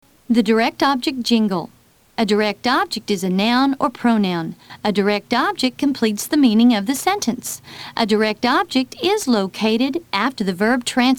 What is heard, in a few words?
direct object jingle